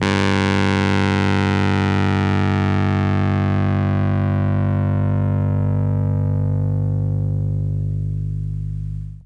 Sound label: Rhodes fuzz; EP FX; PlatinumPhattCD2; E-MU Producer Series Vol. 8 – Platinum Phatt